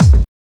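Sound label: BASS DRUM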